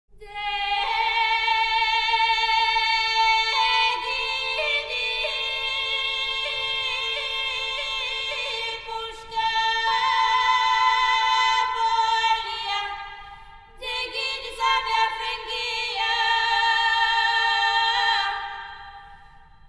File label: Bulgarian Polyphony